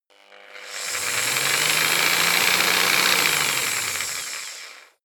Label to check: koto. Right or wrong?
Wrong